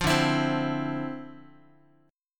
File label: D Minor 9th, down, Guitar; Dm9; strummed